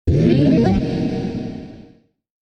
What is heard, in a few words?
Satan Charge Up